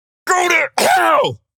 lever; devour

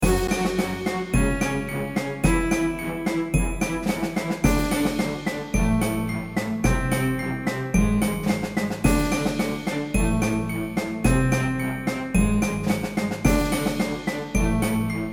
Desert Cave (Orchestra); dragon fantasy book i the volumes of westeria

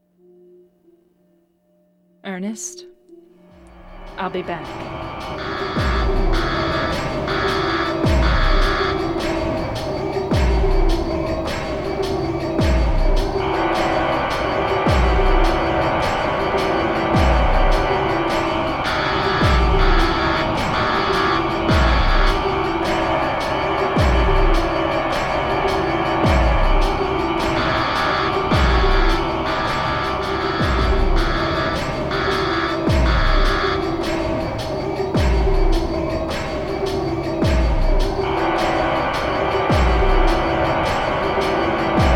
HORROR; Silent Hill 2; Born from a Wish

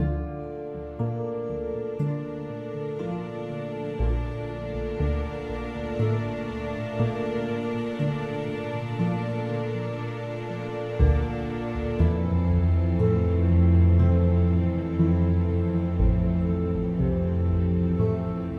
Loop Neutral; the walking dead michonne 2 give no shelter

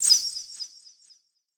SPELLS Arrows; Puzzle Chronicles; PS3; PSP; X360

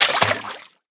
Object Enter Water

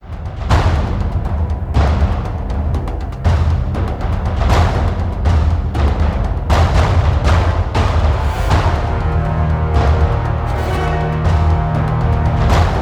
Menu Music; Fractured in Alterac Valley

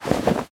Cloth Destroyed; angry birds rio

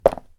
Laminate jump

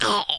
DIE; Dino Shift 2; DINOCHASER

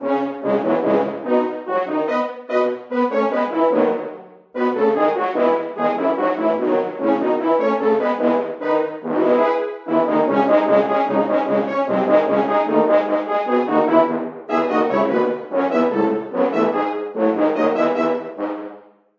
Horn; The Bard's Tale Remastered Trilogy

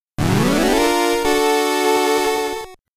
Battle End; Mouryou Senki Madara 1 & 2 Soundtracks Returns

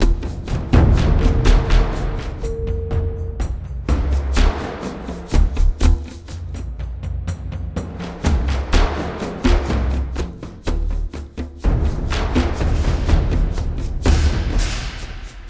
Caves Drums Loop; Ninjago Monastery; lego universe macos windows gamerip 2010

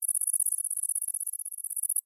INSECT Crickets

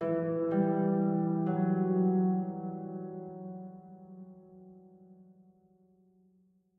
Harp Low

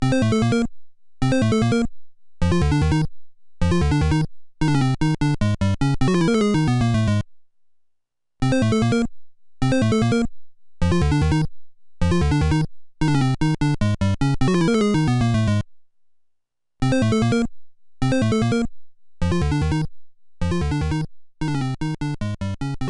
Underground Game Fast; Super Mario Maker for Nintendo 3DS

